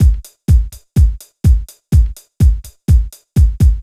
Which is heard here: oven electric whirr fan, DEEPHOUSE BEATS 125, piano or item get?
DEEPHOUSE BEATS 125